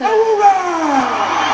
awooga

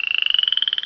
bugs, jungle

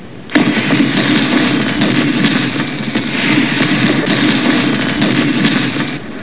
fireworks; Canals

